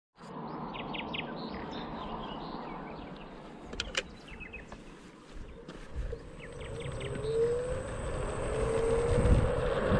scooter; حرکت اسکوتر

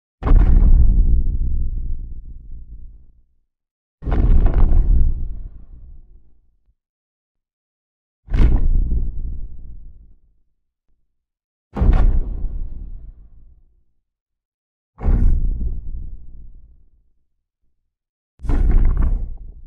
Walking Giant

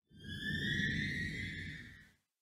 strange scary sounds

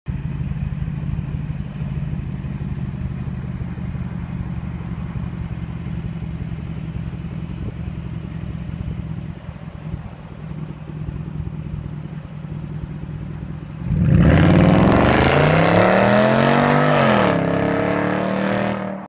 Ranger exhaust

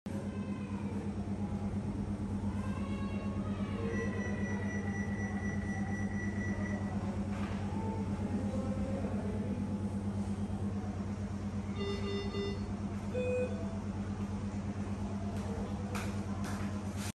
Operating room environment; محیط اتاق عمل

